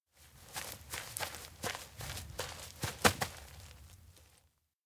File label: steps outdoor grassland stop; rikka; anemoi